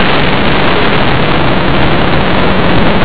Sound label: thunder